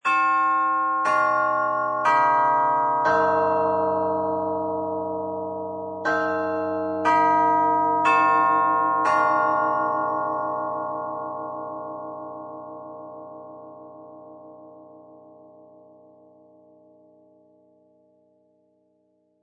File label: Grandfather Clock Chime; Clocks; Machines; Lynne Music